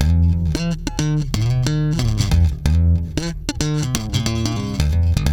SFNK2 F#, Bass, FUNK; Spectrasonics; Bass Legends

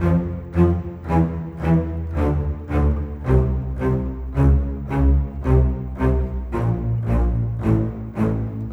Rock Pop Bass Cello, Orchestral

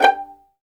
STR 2 PIZZ G5, Vlns Pizz; Roland L-CD702

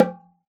oxaca, percussion; ILIO

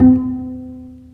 STR Cb Pizzicato; Roland L-CD702; Cb Pizz 1

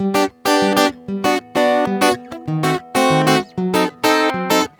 GUITAR SAMBA

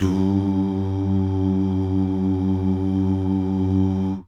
DUUH

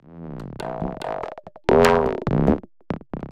TABLAS; Zero-G; Chemical Beats

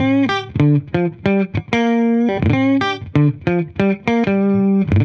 70s Strat 95 G, Guitar, 95bpm, dusty funk

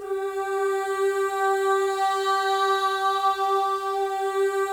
MOVING V3, Symphony of Voices; Spectrasonics

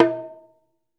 Ritual Drums, Drums Percussion; E-MU Producer Series Vol. 7 – Old World Instruments

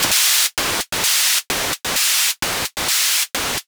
Gated Seq Noise, Gate Filtered 130BPM

